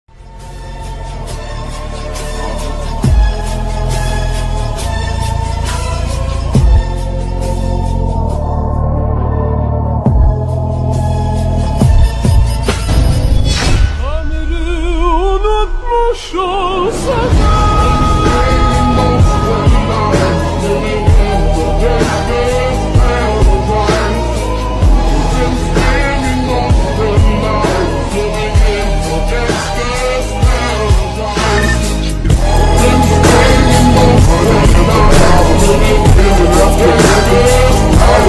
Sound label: instrumental ringtone slowed reverb